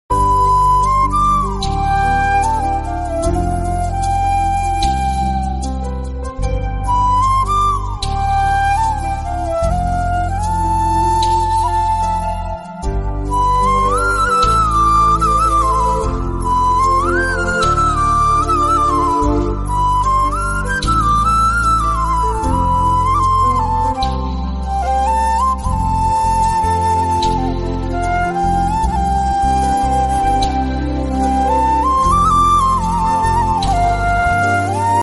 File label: telugu ringtone south ringtones